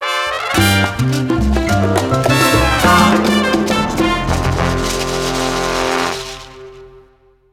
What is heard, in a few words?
Mambo